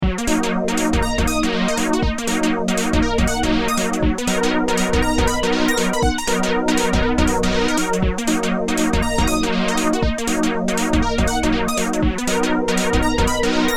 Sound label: arp oct range 3